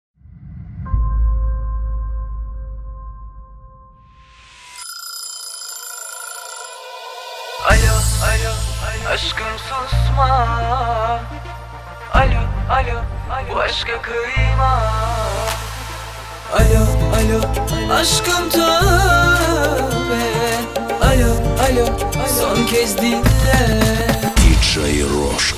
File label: turkish mashup